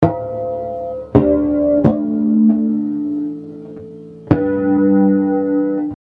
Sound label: Bass Amb D,A,G 098